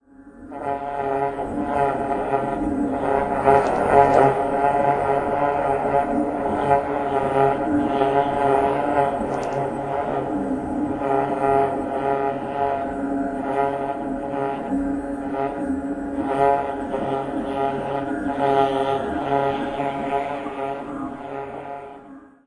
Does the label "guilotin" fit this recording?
No